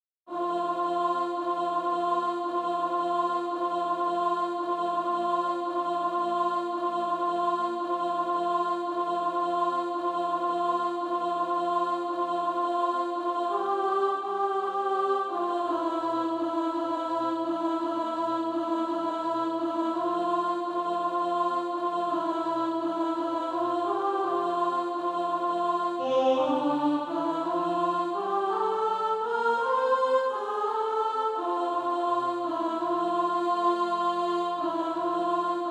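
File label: alto, SATB Practise Music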